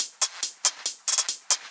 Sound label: Loops 140 BPM Hihat Percussion Loops